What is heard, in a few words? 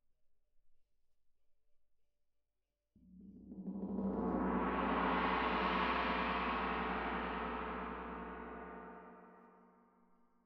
Tam-Tam Main